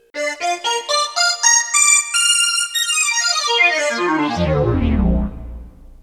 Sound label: LFO Squares